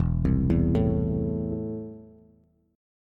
E Suspended 4th Sharp 5th, Bass; arpeggiated; JGuitar

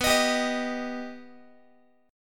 B Augmented B down Guitar; strummed; x,19,18,17,19,x

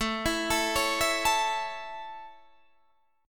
A Major A, chord

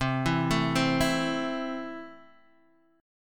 A Minor C, guitar

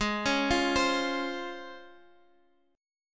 C sharp Major 7th

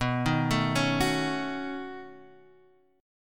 B 9th B down Guitar Half Step Down

